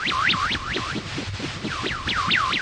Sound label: tones noise loop; LOOPING AMBIENCE